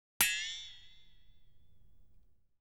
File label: Metallic Twang, Vibratto; BBC Sound Effects Library